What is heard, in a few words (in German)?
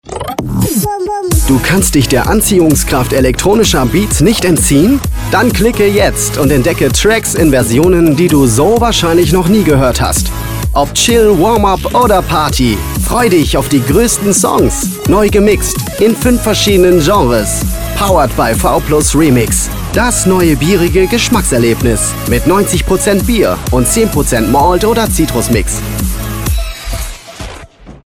remix elektro; veltins vplus